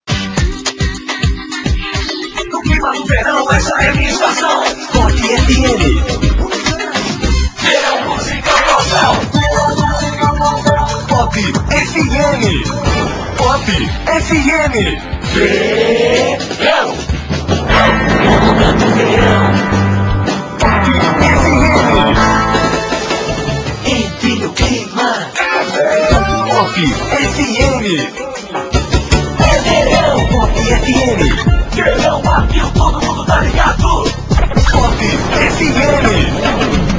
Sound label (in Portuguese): vinhetas verao